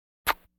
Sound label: Vocal effects breathing Moaning Baby